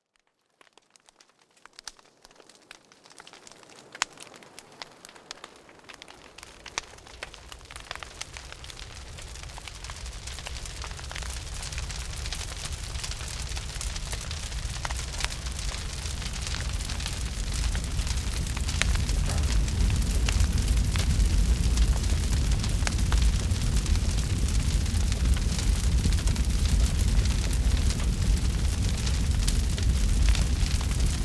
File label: flames sound effect; myro the musical